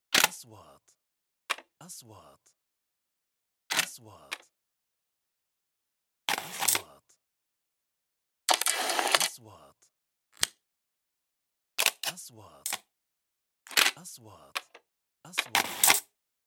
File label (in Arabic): نغمة تنبيه كاميرات; transitions